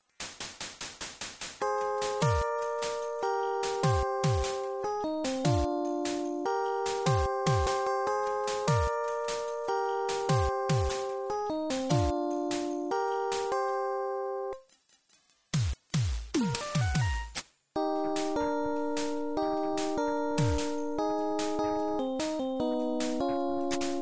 Title Theme; Magical Hat no Buttobi Turbo! Daibouken; Genesis

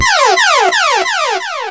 Death; mm7sfx

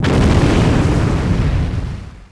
ROCK

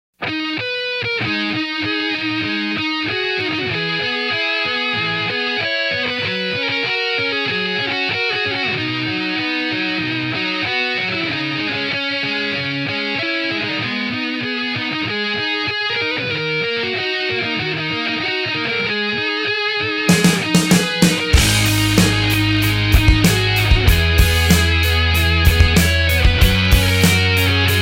BRASS; NinjaGaiden

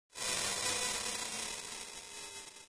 Voice Wolf; Altered Beast; Genesis

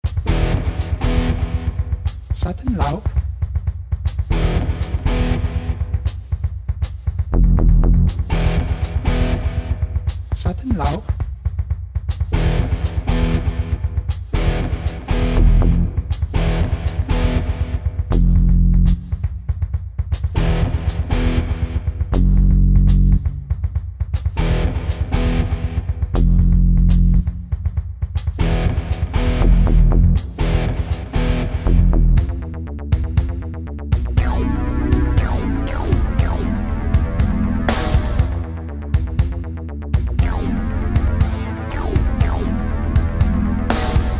Music Cover; Genesis; Shadowrun Genesis

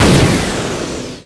ROCK

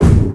ROCK

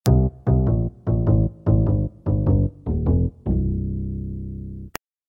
Lose Race, Chiptune; Genesis; Earthworm Jim (Sega CD)

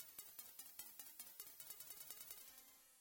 Player Down; Magical Hat no Buttobi Turbo! Daibouken; Genesis

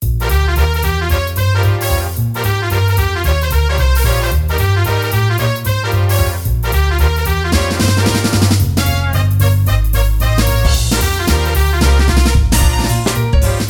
Sing Swing; Metal Gear 2 Solid Snake Remastered

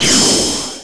ROCK

